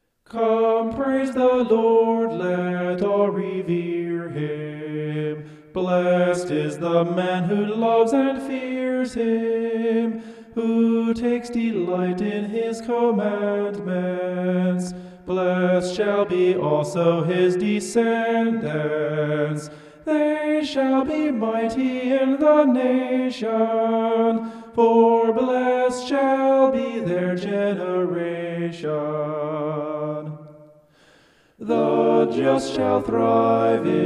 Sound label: vocal homoph; psalm 112